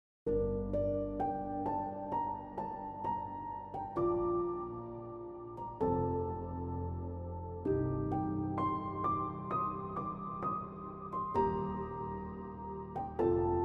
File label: Theme Atmospheric Piano Piano Only